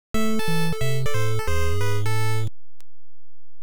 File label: Round Clear MUSIC; Metro Cross NES